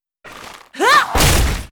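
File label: monster attack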